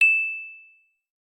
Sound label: Ting, notifications; LG P940 Prada 3.0